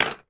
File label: game metal playing piece drop onto others in box tray; monopoly